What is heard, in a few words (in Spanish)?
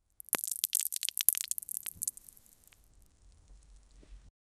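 caída de rocas pequeñas en, rocks falling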